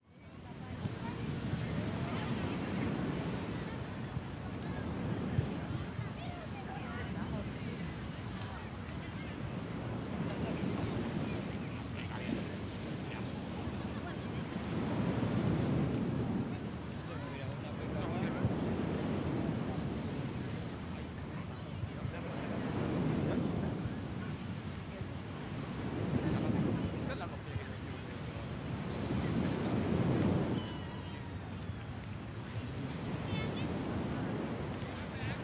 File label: SPANISH CROWD Beach 20-25 People Playing Football